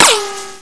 ric, bullets

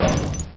player metal

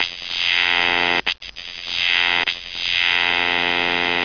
electricity

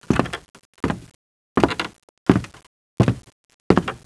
footsteps; geist

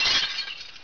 glass, debris

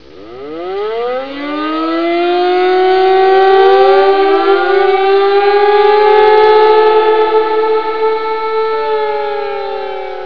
siren

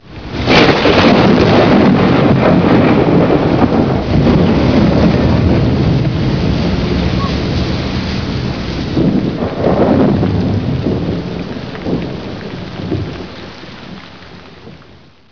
thunder clap; geist

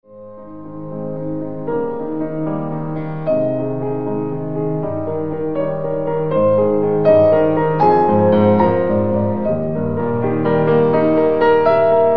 piano

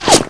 whizz, bullets

